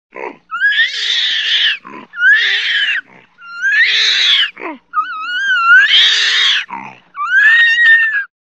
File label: orangutan, animal sounds